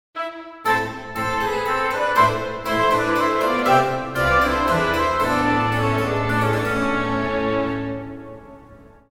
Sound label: Ringtone barocco